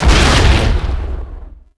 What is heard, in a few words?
explo droid; impacts explos